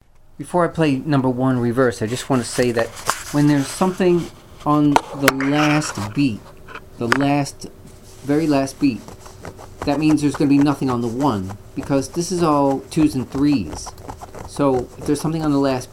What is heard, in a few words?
claves original clave comping 12 bar blues reversed